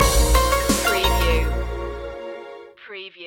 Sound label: dub glide stinger